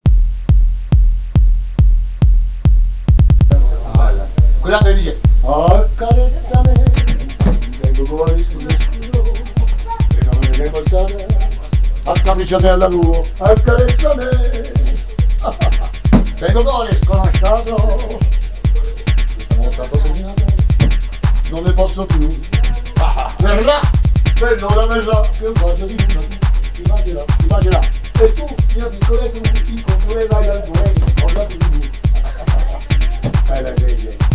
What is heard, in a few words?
aristo acid